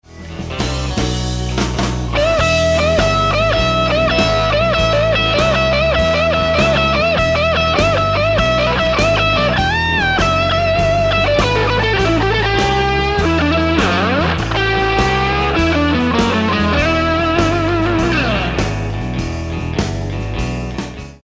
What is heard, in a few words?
Lick, guitar